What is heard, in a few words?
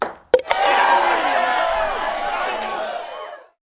beer pong tap; tailgate